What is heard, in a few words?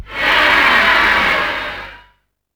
NOISY; UFCTapout1; XBOX